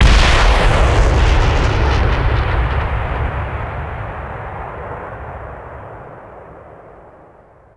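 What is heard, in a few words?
axis bazooka soldier; WAR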